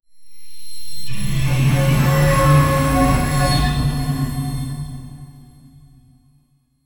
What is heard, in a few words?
a descending crystallized, wind chimes